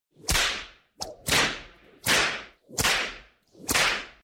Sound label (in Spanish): Latigo